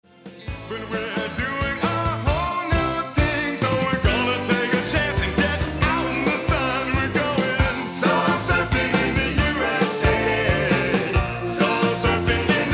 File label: soul surfing; soul surfing usa